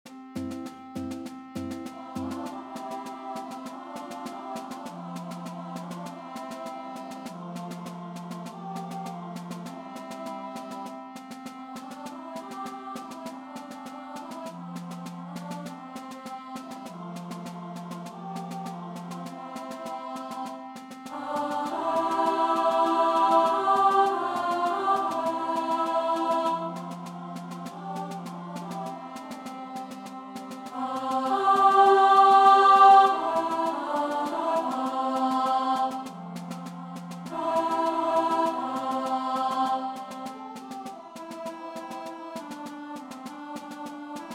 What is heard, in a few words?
Alto